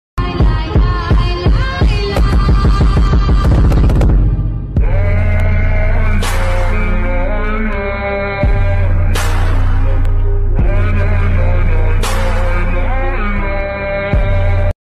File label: slowed reverb ringtone